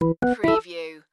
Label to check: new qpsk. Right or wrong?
Wrong